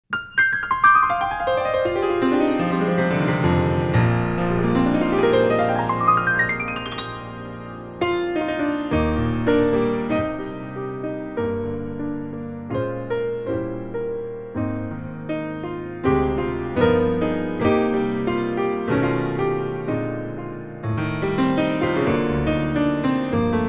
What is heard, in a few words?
Real Steinway B-192